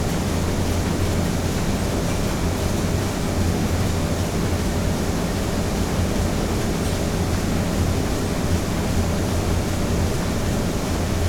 Print Shop Ambience Interior Distance Machine Rhythm High Frequency Noise; The Soundcatcher